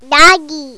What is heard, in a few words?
doggy